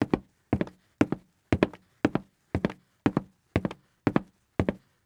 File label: Footsteps Wood; HauntedBloodlines; SFX Producer